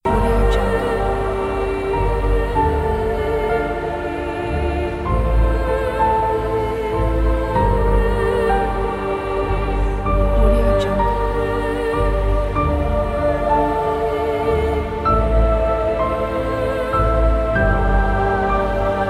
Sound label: heavenly love loop